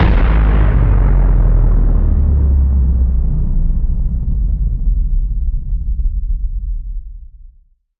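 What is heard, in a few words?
Power Down Cranium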